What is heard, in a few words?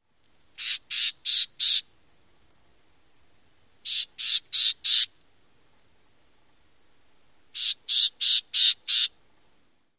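calls, Black-tailed Gnatcatcher; AZ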